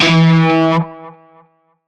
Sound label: Shawzin Oct Two E; Music; Warframe; Narmer Shawzin